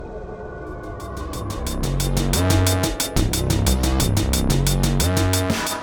build-up; Slinki

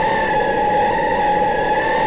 Car Skid Road Dry; NASCAR Thunder 2003

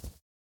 Forest SFX, Moth Wings

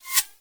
bullet pass

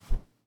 throw; teardown; ps5 windows xbox series xs

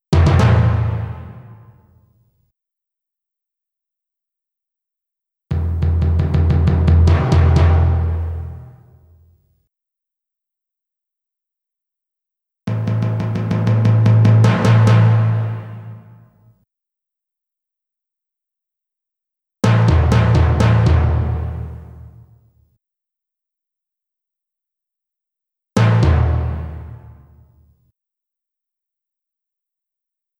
Trembling

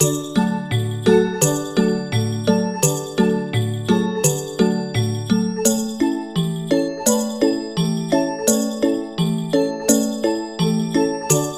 Train Ride; peppa pig world adventures

